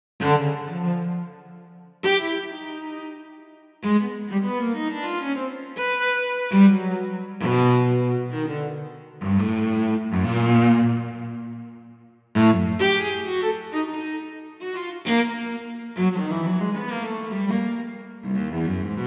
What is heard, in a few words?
Scherzo for Cello